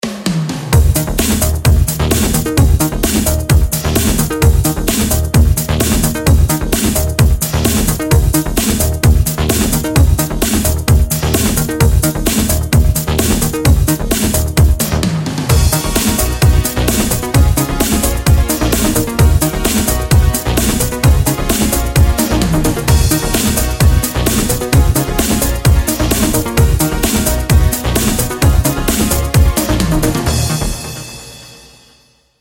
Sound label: 80s Disco Ident; MIUI14 and HyperOS 1.0